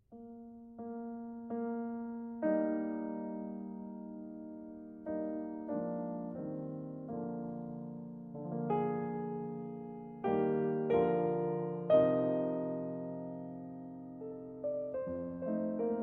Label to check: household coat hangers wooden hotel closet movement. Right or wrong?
Wrong